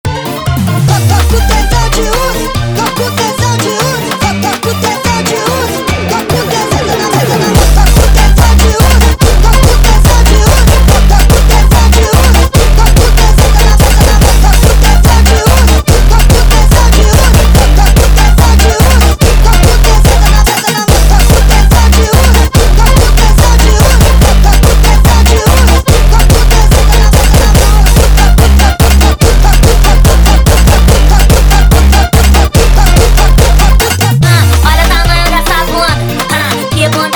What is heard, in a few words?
Bad Ending Jumpstyle sped Up